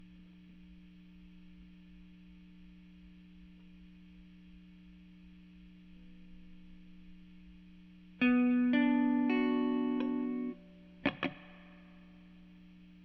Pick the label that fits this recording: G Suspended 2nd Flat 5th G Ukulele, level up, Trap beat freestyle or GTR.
GTR